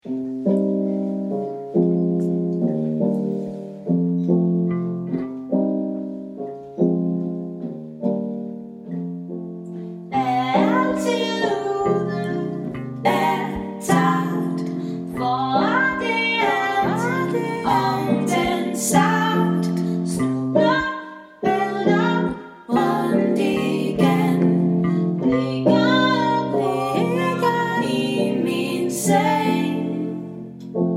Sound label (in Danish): ny dansk sang